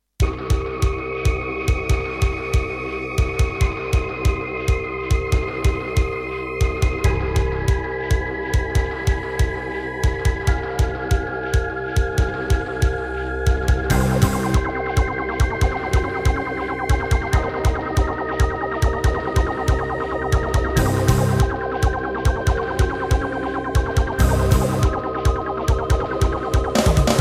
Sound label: Roland SC-88 Pro